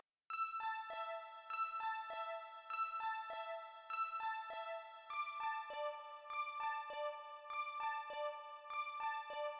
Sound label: UI MENU SYNTH SEQ; Vostok Inc.